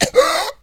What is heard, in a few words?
gas gasp; voice, idle